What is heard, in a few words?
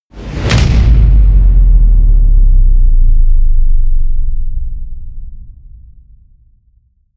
hit metal